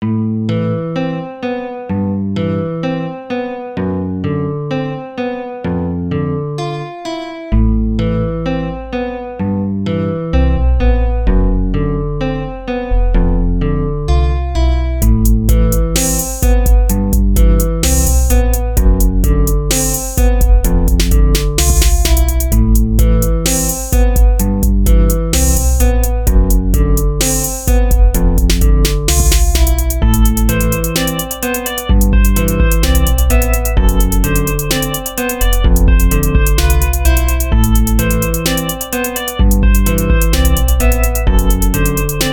beats

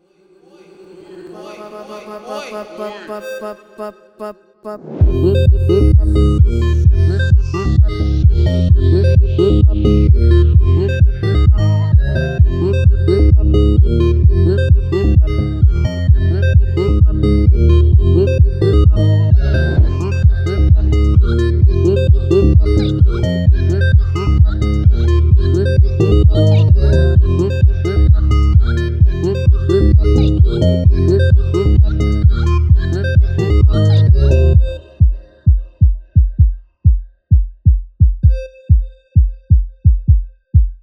Chiptune

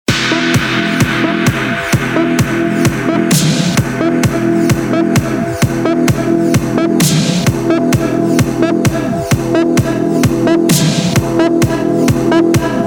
Trap Mashup

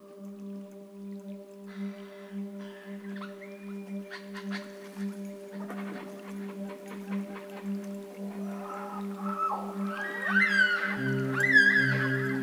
Sounds of Nature White Noise

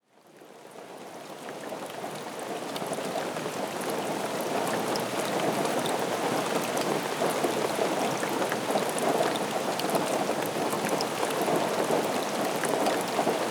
Rain Sounds Nature Sounds

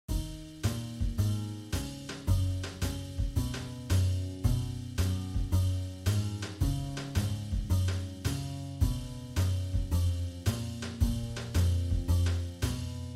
Jazzy Acoustic

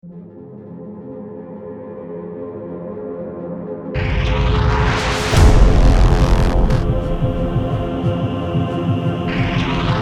Trailers, Helpless

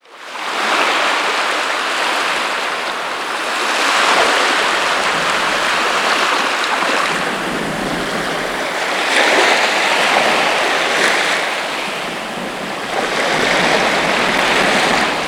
Breaking Waves; Outside Broadcast Recordings

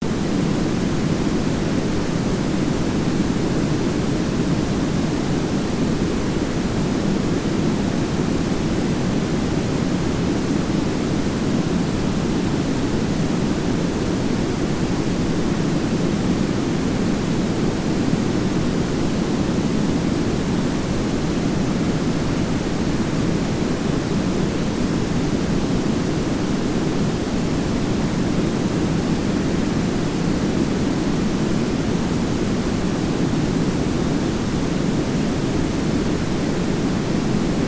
Full Stereo Orange Noise

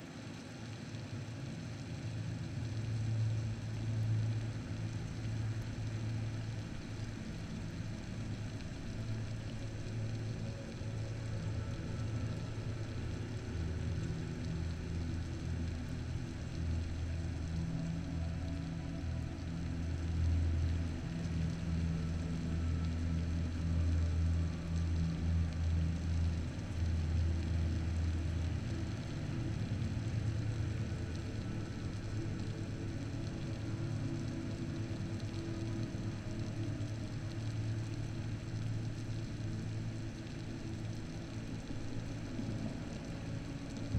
Pink Noise, Calming Rains; Relaxation Sleep Meditation

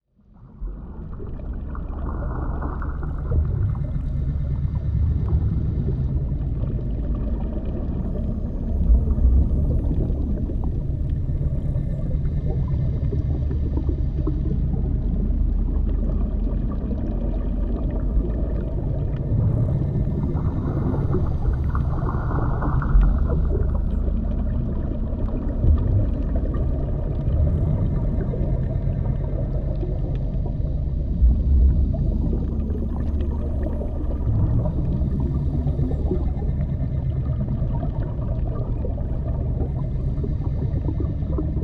Ocean Underwater World; Water Sounds; Majestic Ocean

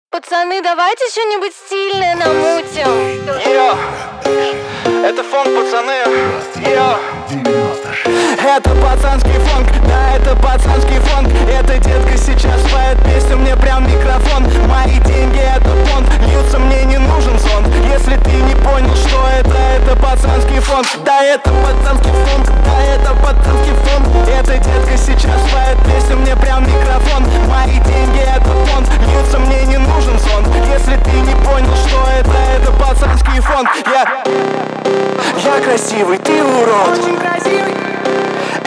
41-46hz FONK

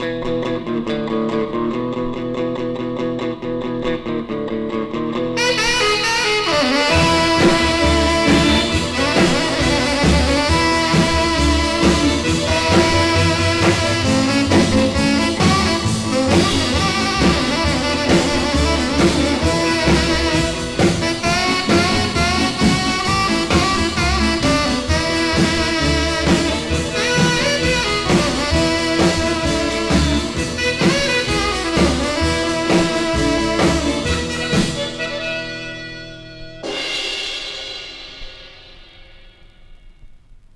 Instrumentals, Rock

